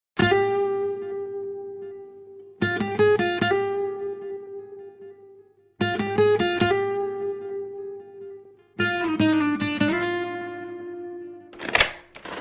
song ringtone instrumental music ringtone trending romantic song